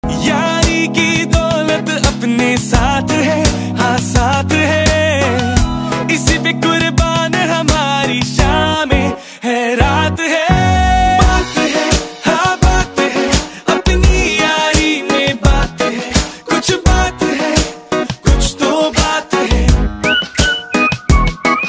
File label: song ringtone